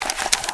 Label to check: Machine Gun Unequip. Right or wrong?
Right